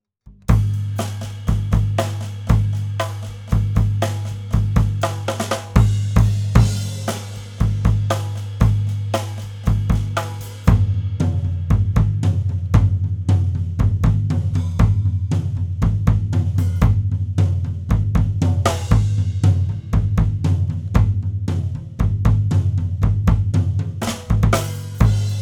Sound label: Kick Rear Whole Kit